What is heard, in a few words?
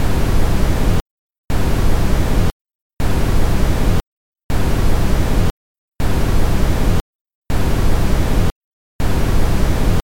white noise ringtone